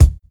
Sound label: thumpy bass drum c sharp key